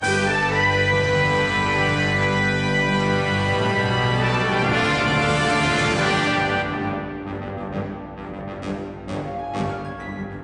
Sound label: Victory; Buzz